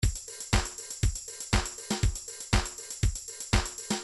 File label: disco 2bar